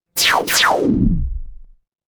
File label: magic scifi laser